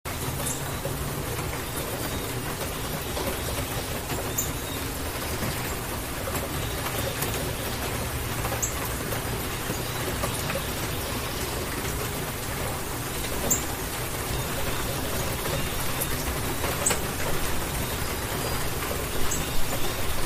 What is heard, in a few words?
rainstorm